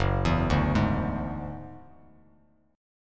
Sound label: F sharp 7th Suspended 2nd Sharp 5th, Bass Standard; F#7sus2#5 arpeggiated